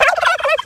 gobble; garrysmod

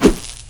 stun swing; garrysmod

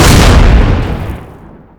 explos; garrysmod

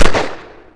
pistol fire; garrysmod; combine pistol

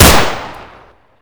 beretta; garrysmod

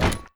heavy walk; garrysmod

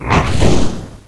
lost soul; garrysmod

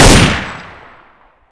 new galil; garrysmod

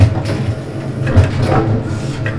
refinery loop; garrysmod

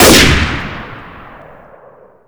new scout; garrysmod

